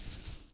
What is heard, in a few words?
foley cloth short